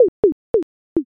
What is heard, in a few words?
dice